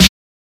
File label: Snares